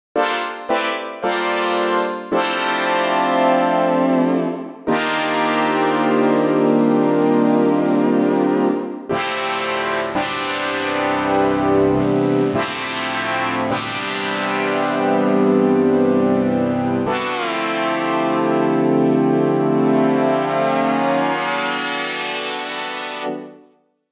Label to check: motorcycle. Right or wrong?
Wrong